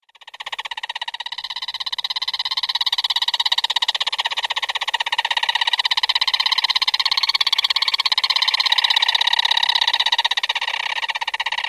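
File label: Black Storm-Petrel rattle call; MEX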